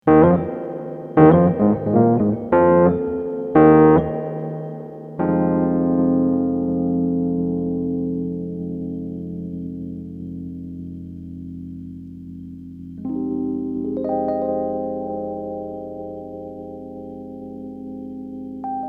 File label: Rhodes Spring